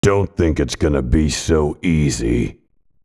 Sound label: death; piccolo